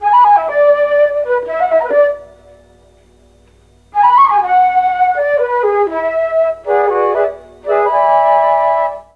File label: B3 CHA FLT, Mellotron; Mike Pinder - Mellotron Archive CD